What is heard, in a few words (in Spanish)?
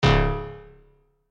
Fallar Disparo; SFX Gameplay 3